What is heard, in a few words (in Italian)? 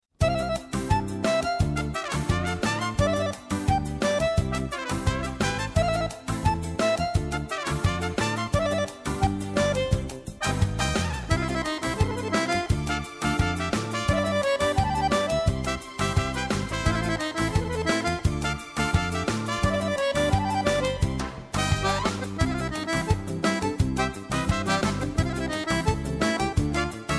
trillo sul bajon